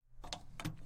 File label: refueling nozzle unmount